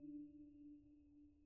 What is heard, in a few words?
sonar Tail Air Medium Directional; Barotrauma; Real Sonar